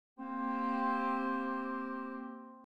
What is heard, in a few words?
chords